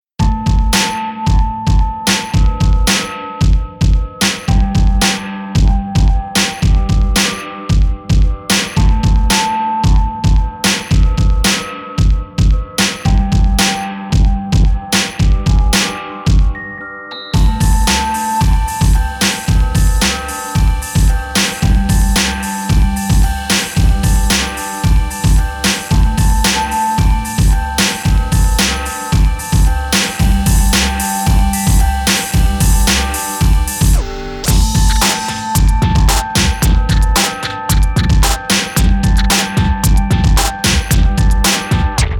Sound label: Hip Cool Hop